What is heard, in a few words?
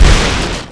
attack; zoochosis whale